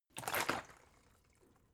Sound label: bottle water big upend; berufe